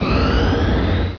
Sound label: power; QB64-PE; assault